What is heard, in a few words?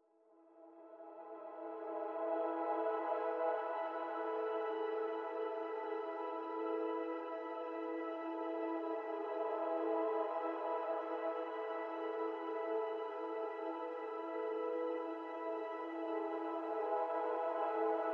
pad; puff-pad 3